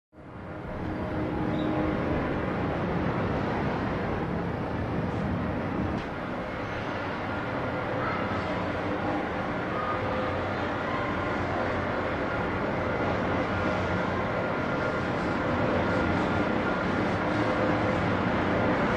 Train Station, Light Activity; Geneva Station; Geneva Train Station Early Morning.